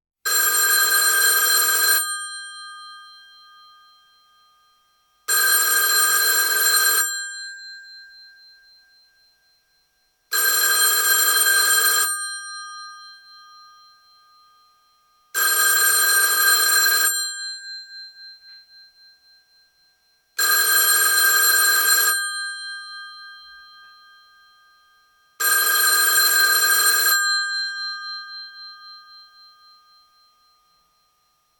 Telephones; Industry & Commerce

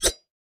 knife throw; Blind Drive

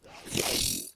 Dead Monk Hit; Blind Quest - The Frost Demon

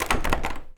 Door Locked Handle Rattle; Doors

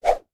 Whip; Transitional Elements; 1001 Sound Effects

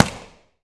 Boxing Slap Stick

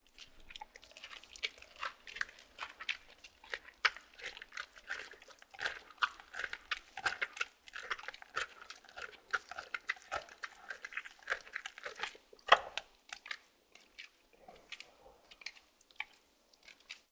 Two Tigers Chew and Licks; Sound Ideas

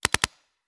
Paintball Gun; Sound Ideas; Guns

